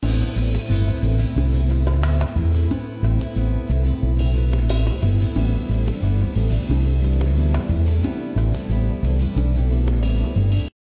ringer; Ringtones

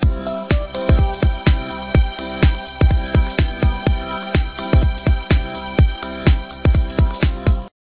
ringer; Ringtones

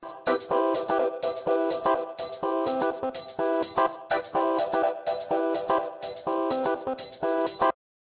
ringer; Ringtones

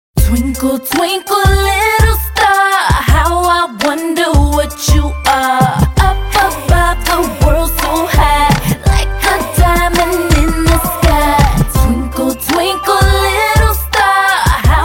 Twinkle Little Star

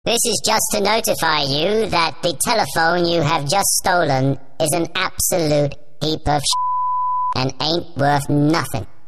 Notifier, Ringtones

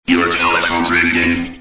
your telephone ringing

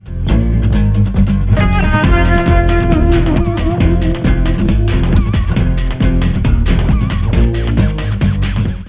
ringer; Ringtones

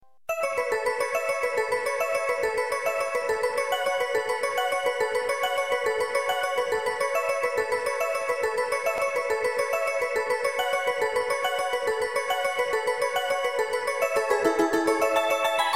shinbashi station

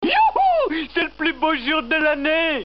YOUHOU

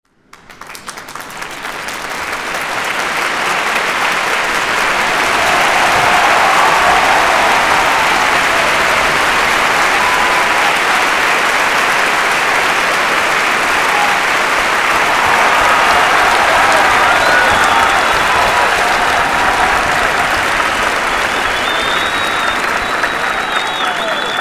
Toilet Applause

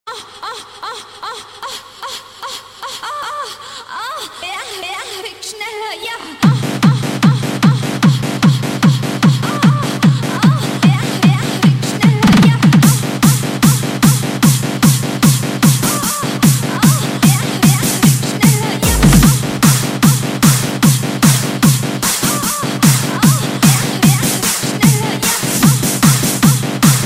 Techno Sex

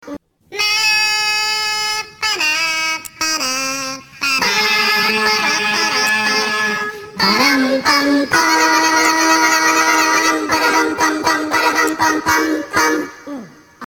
uhah ringtone

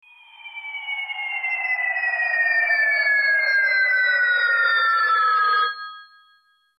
Warped Ring, Ringtones

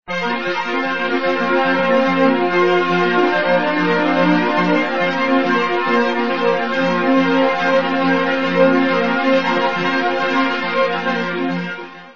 twin string

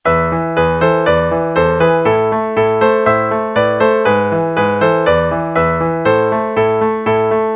ringer; Ringtones

